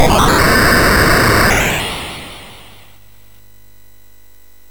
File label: Dragons Den, MOS6581R3